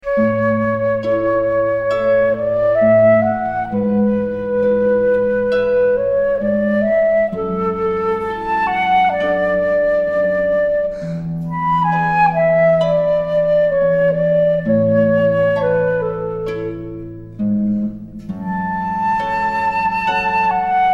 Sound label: flute harp arioso